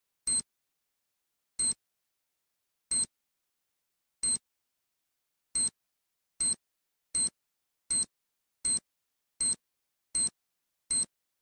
Bomba Cronometrada De Tiempo Efecto de Sonido; Tiempo Explosión

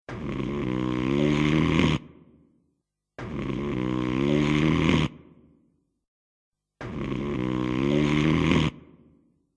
Ronquido

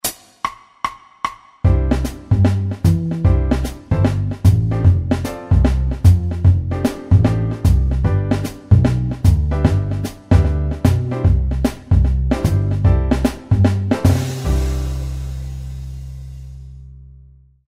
Audio metrónomo y música

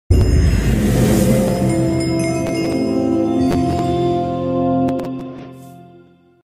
Efecto de sonido DESAFÍO COMPLETADO; Minecraft

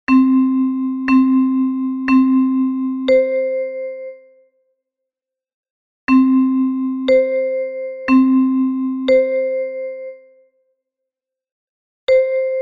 Secuencias agudo grave

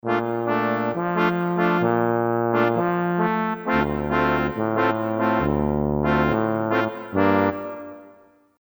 Trompeta

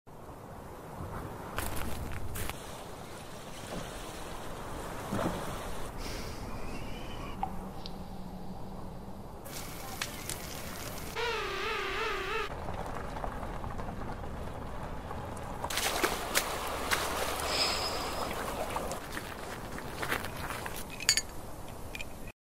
Sonidos de la Patagonia

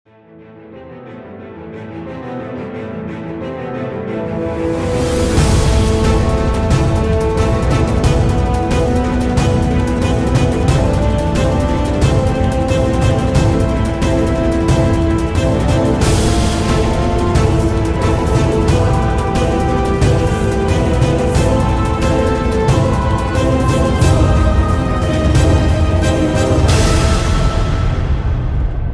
Batalla Epica

